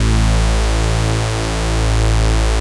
saw lead synth

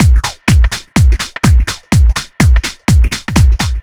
No Clap, Drum Loops 125bpm, Nu Dance Electro; Zero-G; Soundclash Nu Dance Electro; Acidized Wavs; Tough Jam

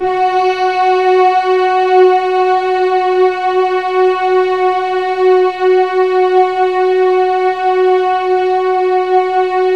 Keypad; Standard Instr; Keypad 2

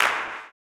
CLAP, blues & soul; ILIO; Vocal Planet

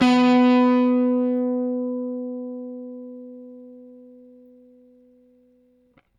Rickenbacker Bass; Airwindows

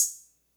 hihat; Big Fish Audio; Hardcore Breakbeat Action; Core 129 Cmin

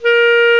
Clarinet & Bass Clarinet

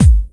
Kick Tam; Digital Sound Factory Xtreme Lead

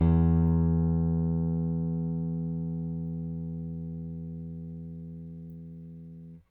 RickThumb-B, RickenbackerBass; Airwindows

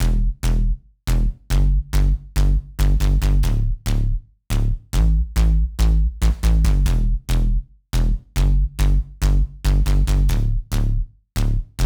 140 BPM Intro Break Bass; Vengeance - Essential Clubsounds Vol.5; Vengeance Intro Kit Root E; Bassline

